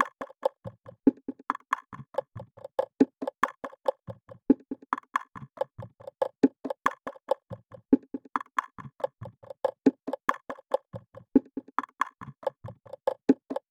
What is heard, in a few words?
Percussion Loop - 140 BPM, Hip Hop; Passing